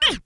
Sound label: VOX, VOCALS; Ueberschall; Jam Box